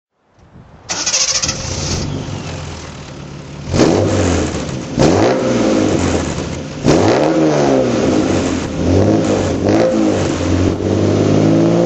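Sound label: triumph tr6 throttle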